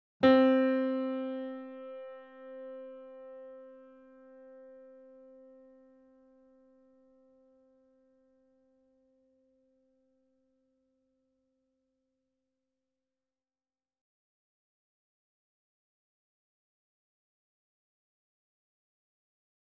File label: C4 E4 A4 Grand Piano & Sample Piano; Sound Sampling Collection VOL-3; Grand Piano 88